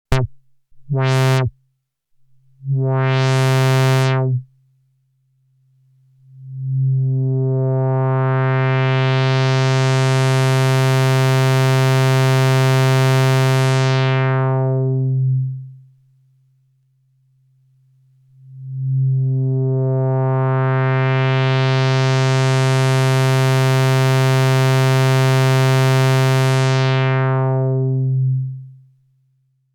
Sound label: Envelope; Treadstone